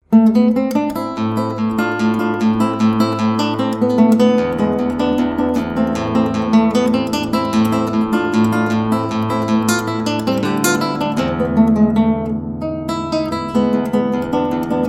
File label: Guitar Sonatina